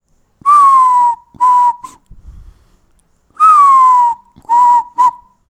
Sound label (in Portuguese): assobiando como o jaó; Quando ouvimos o jaó no fim da tarde, sabemos que está na hora de ir para casa, porque logo vai escurecer; Pia o pio… Os pássaros e as árvores que fazem parte da vida do Martim